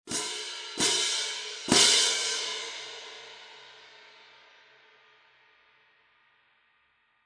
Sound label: Artisan Traditional Symph Med Hvy, cymbal; 20"; Artisan Traditional Symphonic Medium Heavy; hand cymbals; Sabian